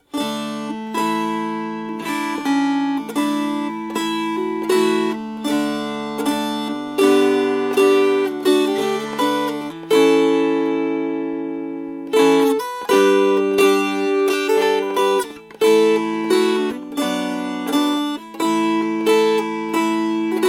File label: Strummed